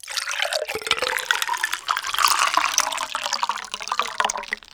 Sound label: water find, hand mining; Cosmic Rage